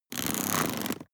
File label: plastic mechanical wheel rattle